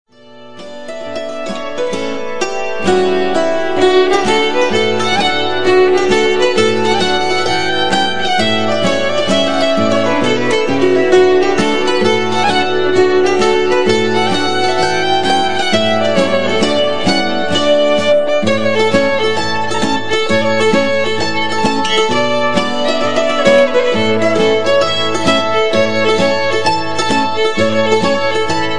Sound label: Rochester Hop, stringtet